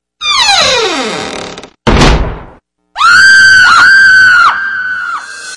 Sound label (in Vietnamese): tieng dong cua va tieng het kinh di; am thanh hung du ghe so